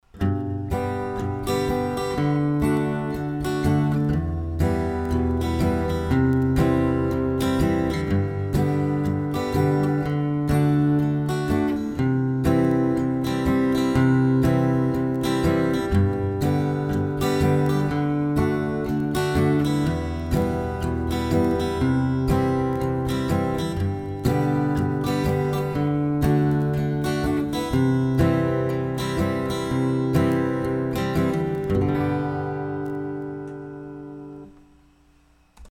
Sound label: strum style tunes